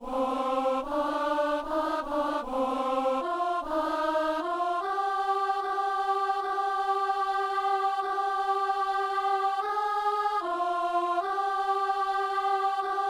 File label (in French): voix unique; Veni Spirito Creatore; Unisson; Messe du 17 janvier à Saint-Denis